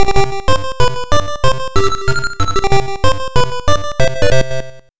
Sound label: arcade unused song; arkanoid